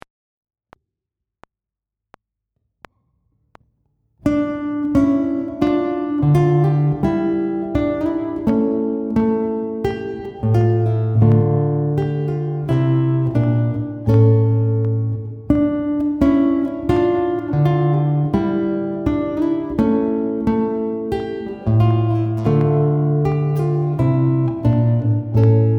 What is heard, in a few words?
Gavotte, Guitar; Grade 4